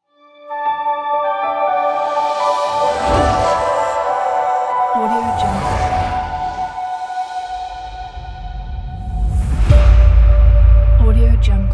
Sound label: ambient reveal logo